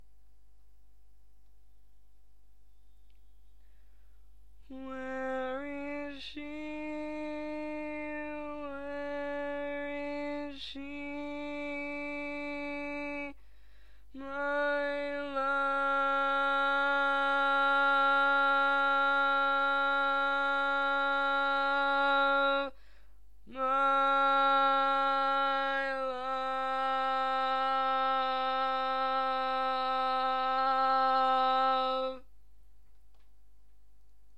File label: Lead